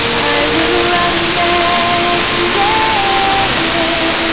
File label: SNR4dB 256PSK